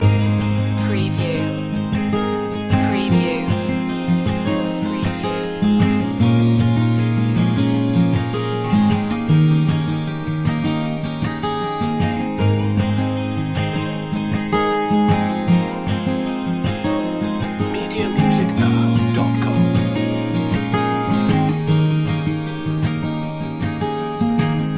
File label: loop